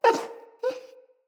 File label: Wolf Cub Trapped Call Far, Animals, Wolf Cubs, Vocals